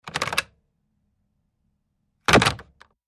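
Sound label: Telephone Pick Up Hang Up Heavier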